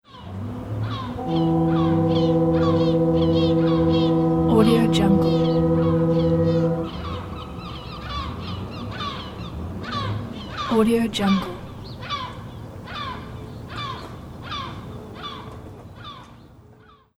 ferry air horn blast ships whistle from distance with seagull; seagulls